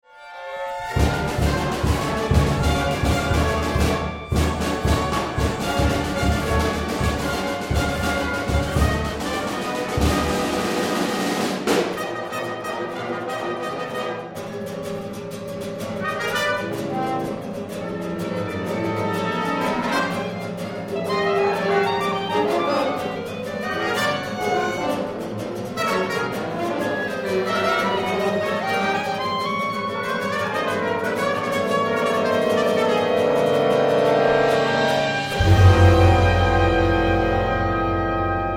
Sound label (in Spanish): TAROLA GUITAR; EL CULTO